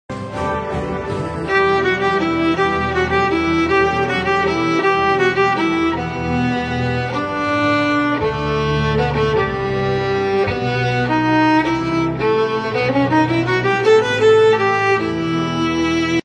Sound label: carol of the bells violin